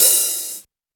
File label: crispy open hat one shot d sharp key